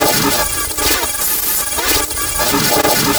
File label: HYPER WARP, INTERFERENCE; Spectrasonic Distorted Reality 1